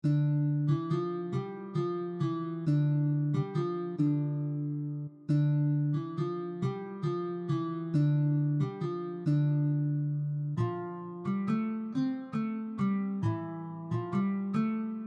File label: Polish Folk